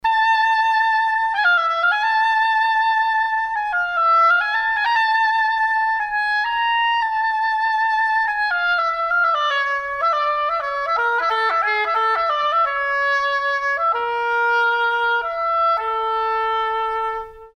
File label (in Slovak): Hoboj